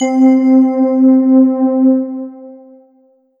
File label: Roland JV-2080 Fantasia C4